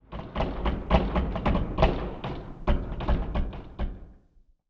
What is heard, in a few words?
bridge stress; ambient; Counter Strike Model Pack; CS:GO; survival